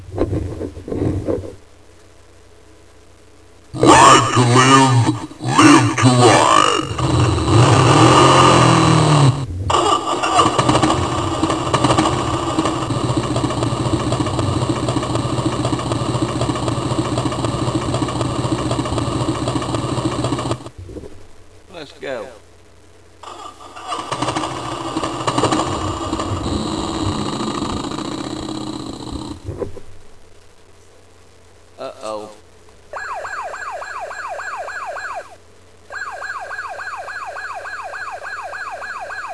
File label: motorcycle busted